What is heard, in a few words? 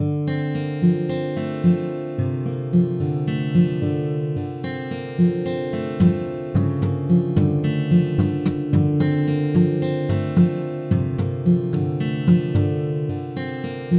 Intro Instrumental; D&D Music